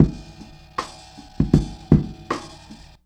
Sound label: fading fast, Drum loops Boombap